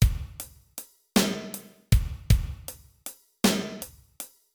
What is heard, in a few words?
Basic Beat Hi-Hat; Pro Tools Blues